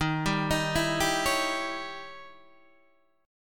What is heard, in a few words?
Eb 7th Flat 9th Eb, Guitar Open D; 13,10,13,10,10,11